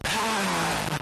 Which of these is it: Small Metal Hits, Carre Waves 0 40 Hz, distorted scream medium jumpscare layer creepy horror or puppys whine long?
distorted scream medium jumpscare layer creepy horror